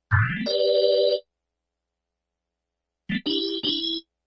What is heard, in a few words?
Swish Organ; Funk & Rock; Loopology; Get Dirty